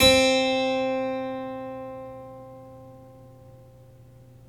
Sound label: Clavecin C5, Harpsichord; Giga Samples Collection